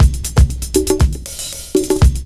LOOP, DRUM; E-Lab; X Static Goldmine 2; akai

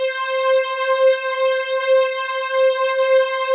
SYN STR, Datafile

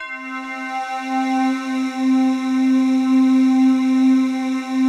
PAD, ATMO PADS; Best Service; XX Large

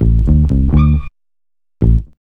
B-LOOP

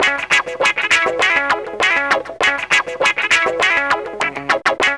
GTR LOP; E-Lab; X Static Goldmine 2; akai

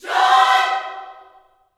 JOY CHORD, Vocal Gospel; Spectrasonics; Vocal Planet Vol.1 Gospel; akai

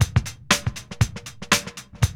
LOOP; E-Lab; X Static Goldmine 2

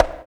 CONGA; E-Lab; X Static Goldmine 2

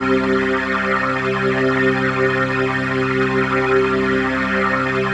PHASE PAD, ATMO PADS; Best Service; XX Large

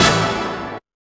BIG HIT

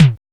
BD

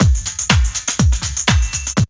BREAK

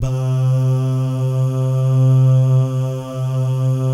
"bah" guy, Vocal Jazz Fx

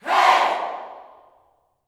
"HEY", Gospel, YELL SHOUT; Spectrasonics; Vocal Planet Vol.1 Gospel; akai

